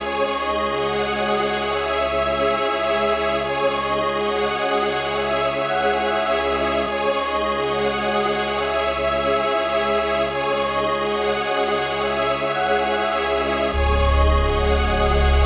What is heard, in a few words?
140 BPM C# Min, Drill; Too Tough